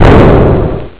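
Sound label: explosion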